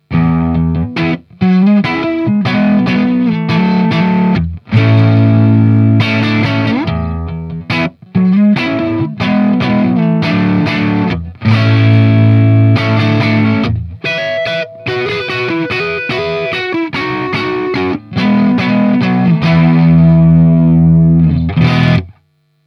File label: Marshall TMP